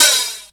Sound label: 127 DRM, Fresh Disco House; USB Soundscan vol.20 - Fresh Disco House I